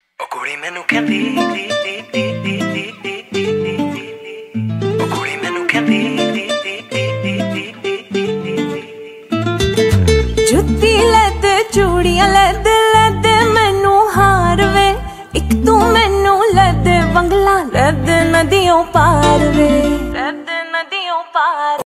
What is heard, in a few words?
Female punjabi ringtone